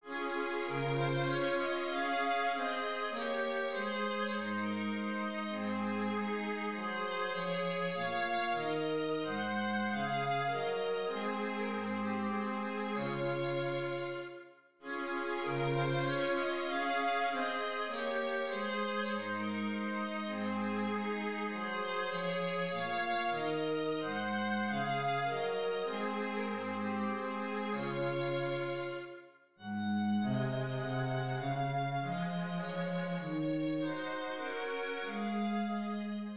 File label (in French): valse ra majeur; beethoven ludwig van